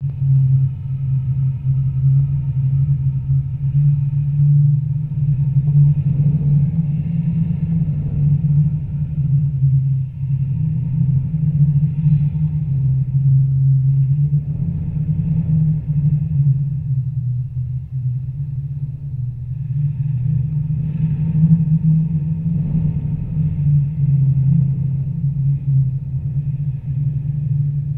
dark deep canernous drone; sound design; tom chapman

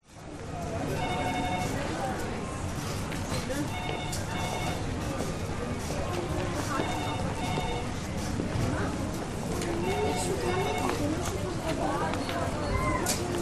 Australia Store; audio hero

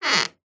household door wooden creak short

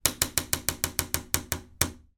household door wooden creak old loud slow

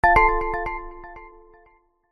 multimedia alert positive bright; zapsplat

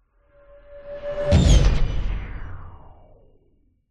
sound design whoosh sci fi pass hit; zapsplat

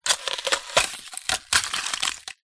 nature ice crack break

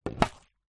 food tin biscuits open; zapsplat

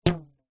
foley elastic band fire flick shoot

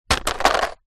vehicle car hubcap plastic land in road

